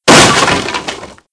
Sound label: Smashwood; Playable Tutorial Level